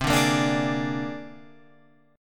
C 7th Suspended 2nd Sharp 5th C down Guitar Open F; C7sus2#5 strummed; JGuitar